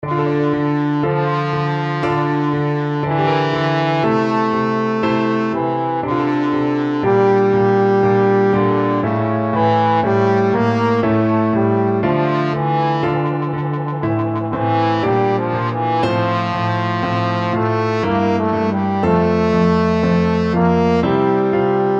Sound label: sonata, trombone